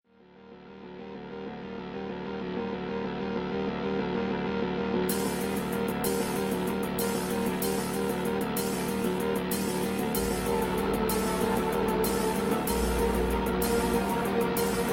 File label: mehr instrumental